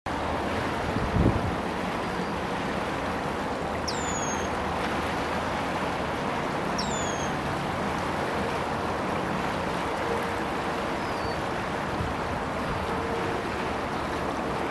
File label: Tamias, سمورچه